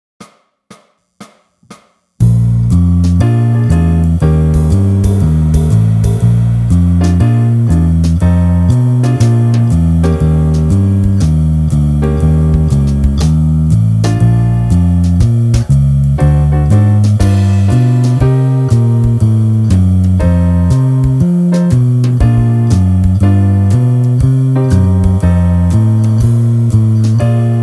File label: Blues in Bb